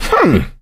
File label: positive noise; voice; source engine; left 4 dead 2; survivor